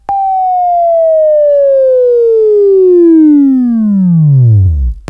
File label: WAV Right, Sweeping; Labview-Program; Sweeping Training; Buzz